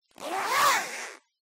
zipper; Barotrauma; Neurotrauma

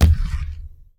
footstep; Barotrauma; Barotraumatic; Sentinel

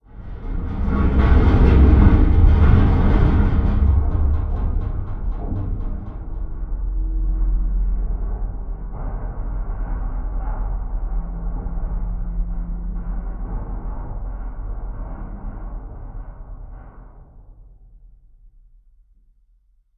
Creak; Barotrauma; Room FX

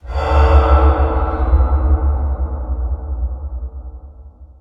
Ambient; Barotrauma; Room FX

